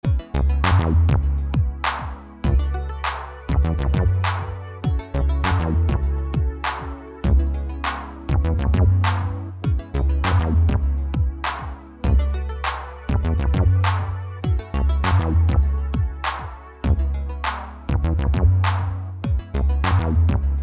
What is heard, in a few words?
Roland Dimension SYNTH